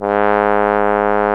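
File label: brass trombone G#2; Roland L-CDX-03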